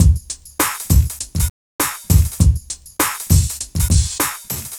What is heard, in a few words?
Smooth, R&B Drums